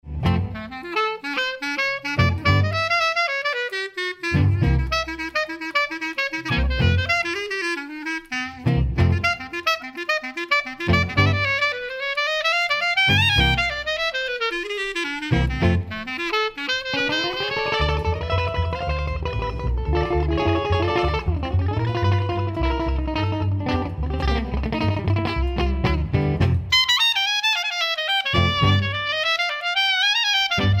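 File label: clarinet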